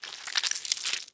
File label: Horror Scary Guts Wet Human Guts Squeeze